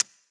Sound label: chip One, roulette; americanroulette3